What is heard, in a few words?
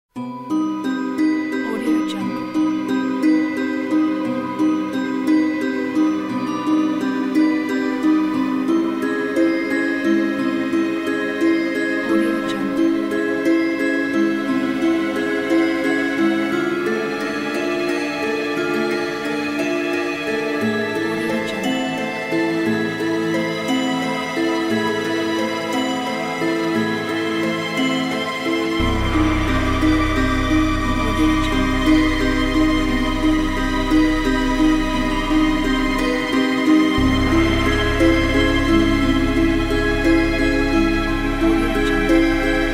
mystic halloween harps